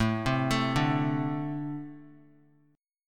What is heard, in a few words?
A-Minor Double Flat 5th A Guitar; Ambb5 arpeggiated; JGuitar